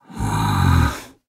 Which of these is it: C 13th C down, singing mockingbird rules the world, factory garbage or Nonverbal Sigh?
Nonverbal Sigh